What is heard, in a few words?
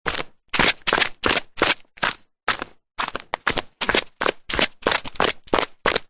foley footsteps walking down stone steps flip flops